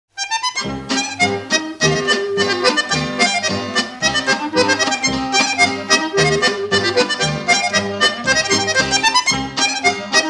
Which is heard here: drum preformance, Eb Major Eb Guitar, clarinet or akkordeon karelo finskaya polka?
akkordeon karelo finskaya polka